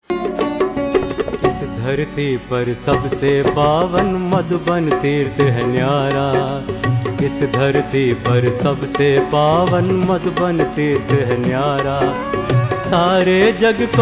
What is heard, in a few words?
Devotional